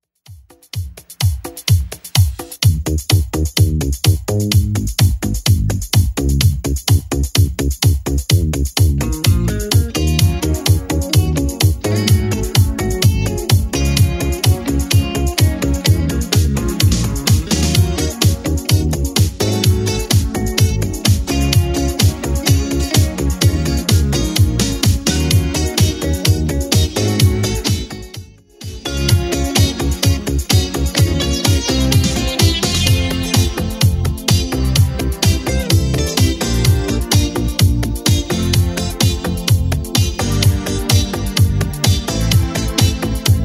80s, 127bpm

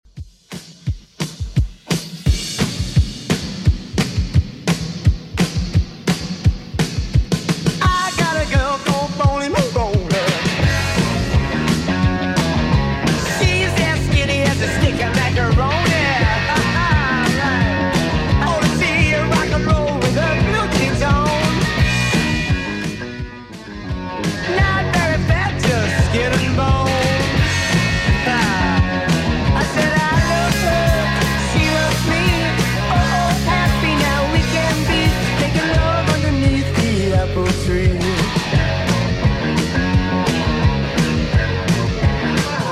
70s Redrum 172bpm

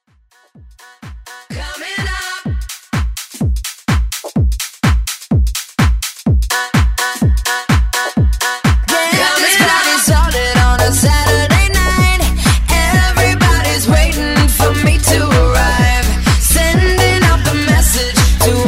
House Vocal

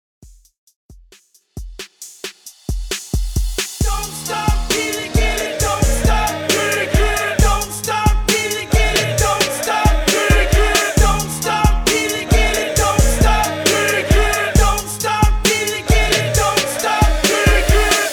90S HYPE REDRUM 67 BPM

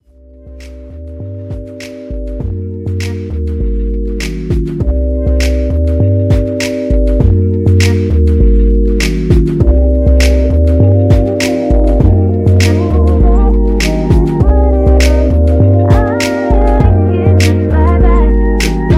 Twerk Mix 100

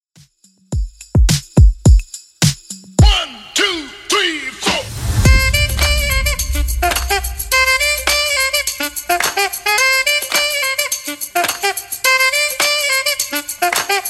90s DJ Friendly Redrum 106bpm

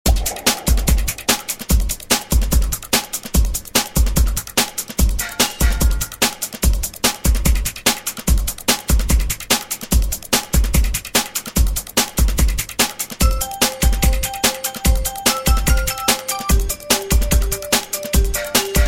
80s Dj Intro Outro 146Bpm

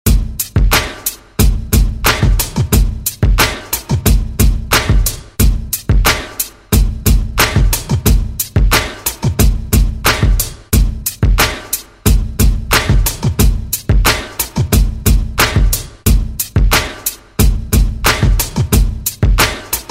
Party Hype; Deejay Hit; Redrum

